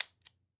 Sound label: Weapon Gun Pistol 22 Caliber Safety Off; AdobeCC